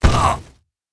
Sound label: orni die; megaglest